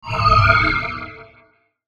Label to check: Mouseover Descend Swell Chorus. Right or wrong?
Right